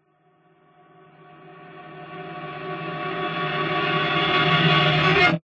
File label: horror tension building reversed crescendo metal scrape